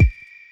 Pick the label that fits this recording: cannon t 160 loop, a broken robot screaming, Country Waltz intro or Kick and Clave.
Kick and Clave